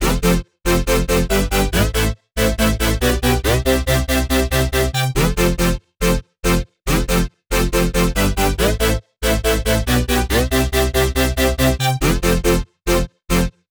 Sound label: Future Bass Drop Loop 140 BPM A Min, Synths